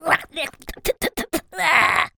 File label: cartoon character spit out disgusting; zapsplat